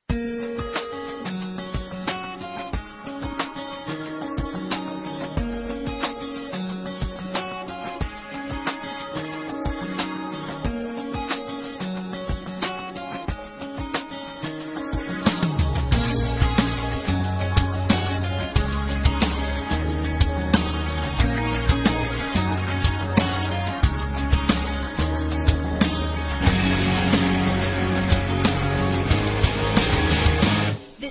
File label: karaoke instrumental, ringtones